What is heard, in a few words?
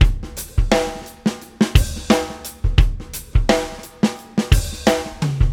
Shuffle, Rock Drums; ACID MUSIC